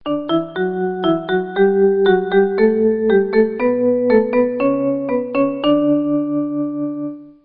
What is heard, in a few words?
scale